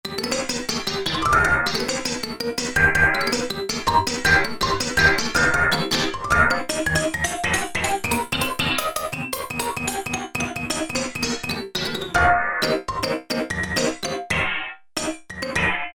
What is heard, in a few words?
Percs Hit, Roland U-220; Factory Patches